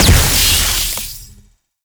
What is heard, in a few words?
Wave Ability Ice Proj By Explosion; Enemies; Abilities; Warframe; Duviri; Dax Knight